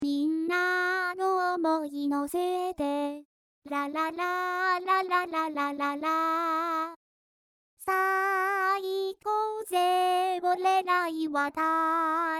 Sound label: lalalalala